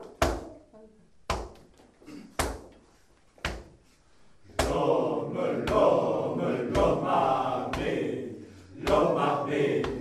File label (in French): choeur chant